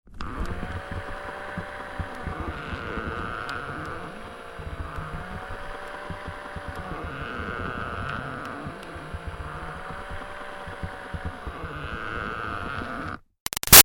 Toy Baby Doll Motorized Moves Plastic Creaks; Miscellaneous Toy; Toys

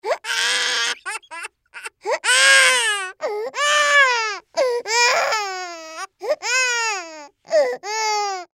Cry Long Male Toddler; Male Two Year Old Boy; Human; Blastwave

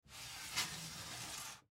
Household Window Sliding Glass Open; Blastwave

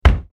Foley Door Cabinet Close; Cabinet Doors; SFX Bible 6

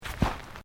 FOOTSTEPS SNEAKERS GRASS STEP; Footstep Foley; Human

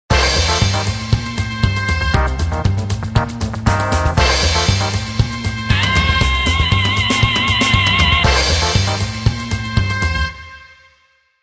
Upbeat Jazz Stinger; Royalty Free Bumpers Music

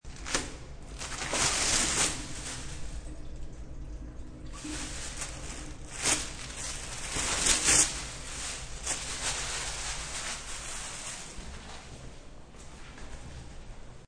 Pulling out toilet seat cover.; Public Bathroom Sound Effects; Sound Effects:Offices

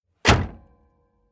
Old Landrover Bonnet Closed Single Metal Ring Off; Transport Agriculture; Truck Sound Effects

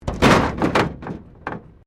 Wreck Door Panel Clunk Metal

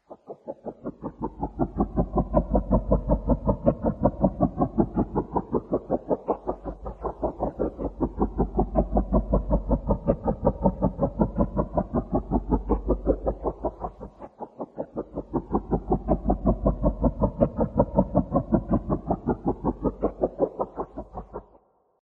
Ghost Copter, ghostly synthesized helicopter; Vehicles; Helicopters

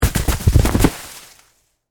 Object Roll Snow, Weather, Object, Roll, In, Snow; BlastWave

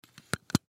Needle Close Cap; Hospital Sound Effects; Surgeon Surgery Four Inch Disposable Bone Marrow Biopsy Aspiration; Emergency

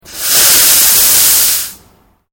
MACHINERY PRESSURE VALVE RELEASE STRONG; Industrial machines

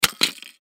Rat Trap Snap, Rat Trap Snap Soft; Mousetrap

